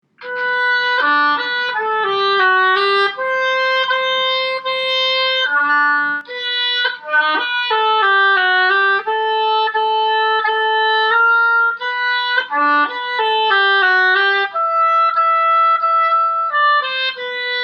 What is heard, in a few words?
Oboe; 8th grade All-State